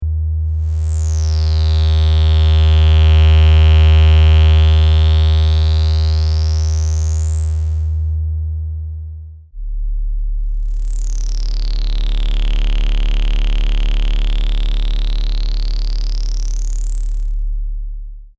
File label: sine grinder